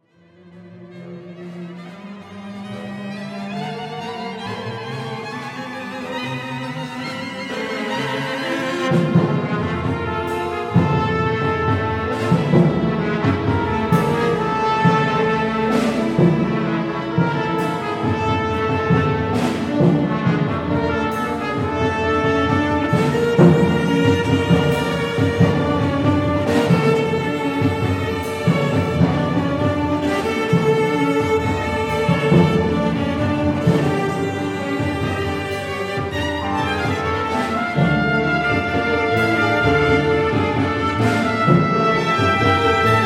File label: Tango